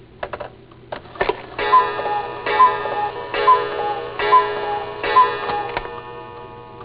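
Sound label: cuckoo clock; EFFECTS; CLOCKS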